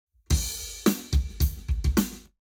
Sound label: Drums, 90deg; eMagLS; Lab Wet